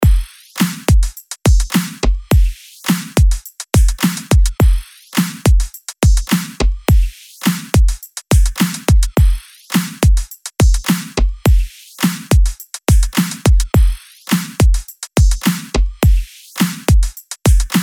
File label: DRUM LOOP POP 105BPM